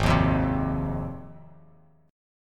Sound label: G sharp 6th G sharp down, chord; strummed; JGuitar